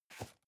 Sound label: cardboard box medium place slide soft; objekte